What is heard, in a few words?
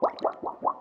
bubble almost burst; CrossCode